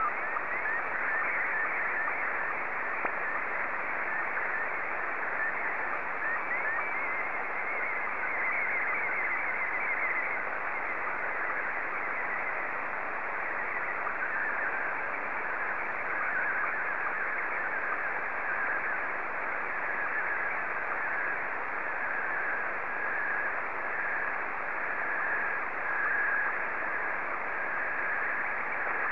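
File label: SSTV, Scottie 1; BA3QJQ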